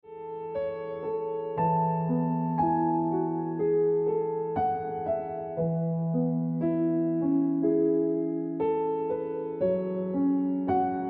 Piano Music

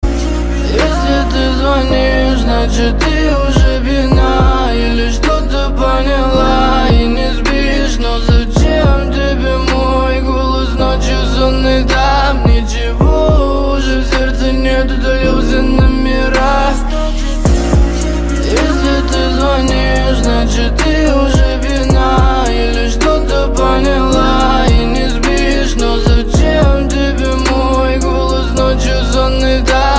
Turkey Ringtone

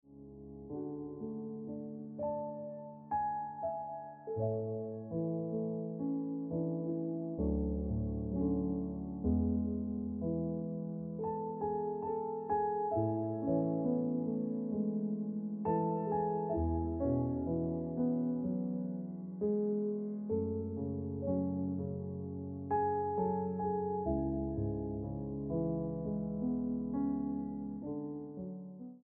Ringtone Piano Music

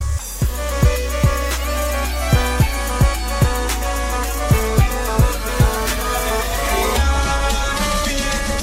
Turkish Icecream Ring Turkey Ringtone

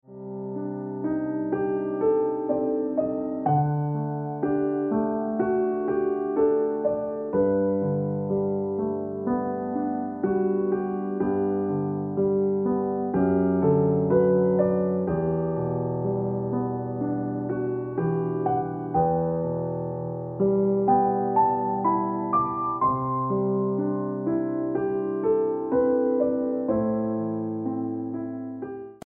Ringtone Piano Music

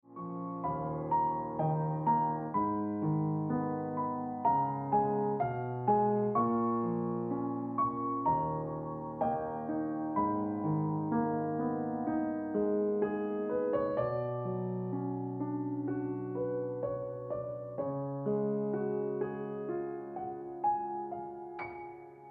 Piano Music

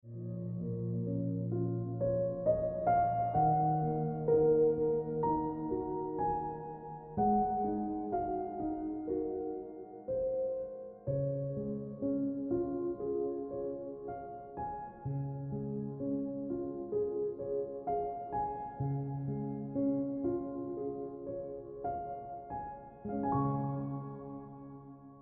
Ringtone Piano Music

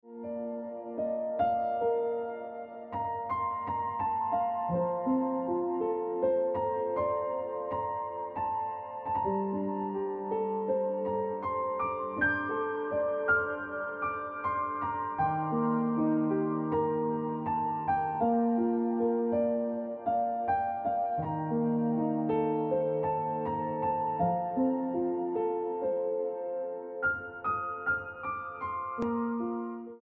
Ringtone Piano Music

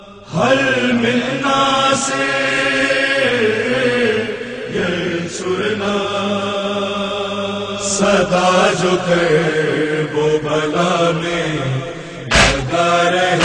Pakistani Ringtone

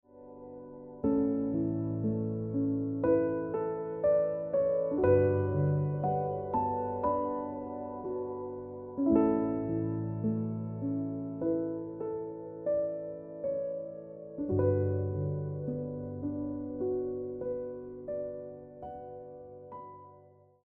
Piano Music

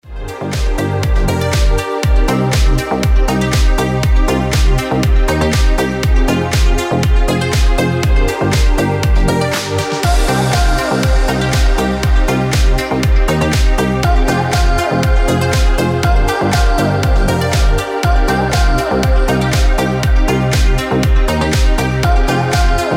Ringtone

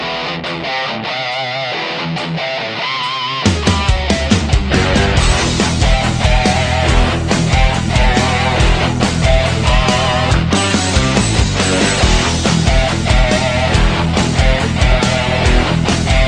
Turkish Rock Turkey Ringtone

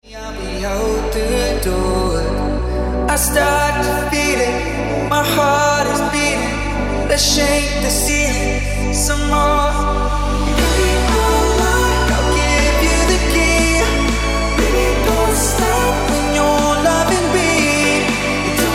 Ringtone

